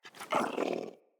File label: Kubrow Puppy Interact Sleep; Vocals